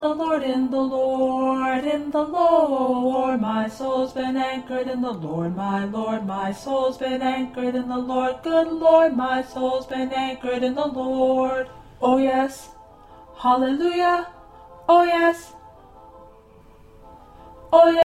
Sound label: 1st Tenor